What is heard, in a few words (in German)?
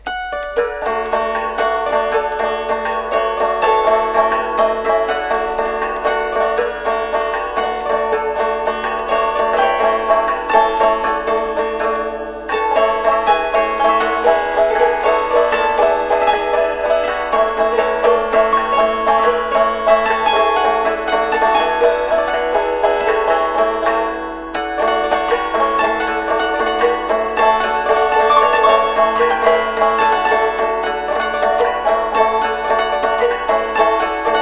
Walzer